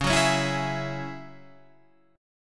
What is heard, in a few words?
Gsharp 7th Suspended 4th Sharp 5th Csharp, Guitar